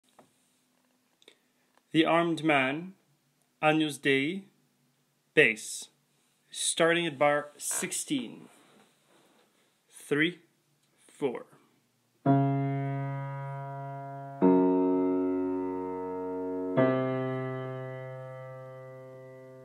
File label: Bass Piano